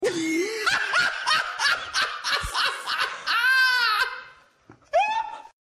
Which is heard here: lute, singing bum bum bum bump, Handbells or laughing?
laughing